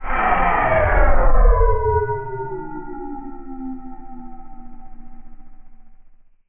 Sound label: Laser C Sustain